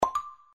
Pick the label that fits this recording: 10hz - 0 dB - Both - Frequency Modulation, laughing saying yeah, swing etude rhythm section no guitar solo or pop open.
pop open